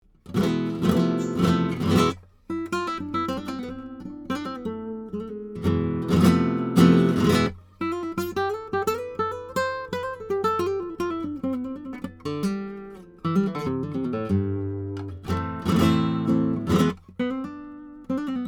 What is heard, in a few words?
Ocean Improv, Flamenco